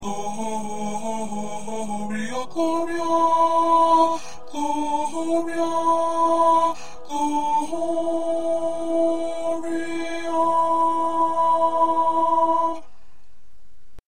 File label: 2nd Tenor